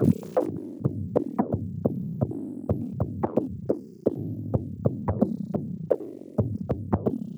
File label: Perc Lowmover 130